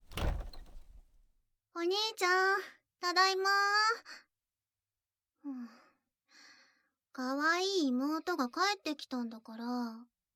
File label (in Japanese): バイノーラル えっち